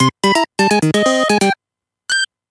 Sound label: death fanfare; kirby